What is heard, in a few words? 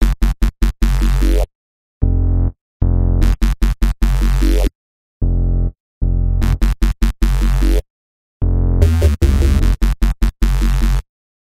Bass Dubstep 140bpm